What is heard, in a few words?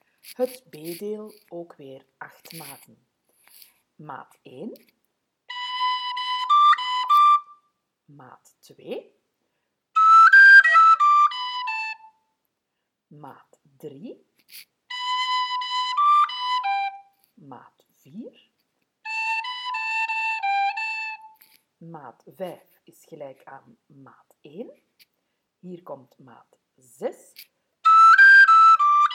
kesh jig B